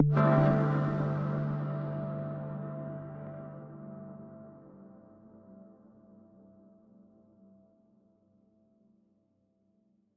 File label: Jingles